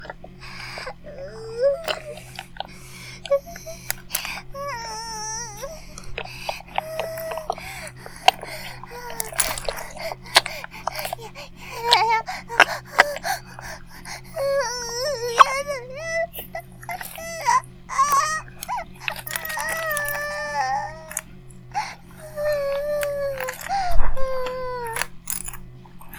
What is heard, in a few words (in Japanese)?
バイブ放置; イクたびにわけて